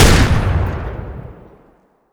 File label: Automatic Warrior, Weapons; DCS